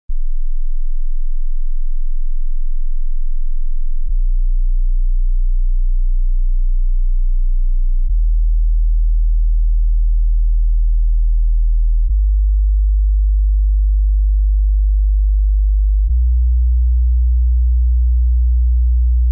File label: sine wave test monitors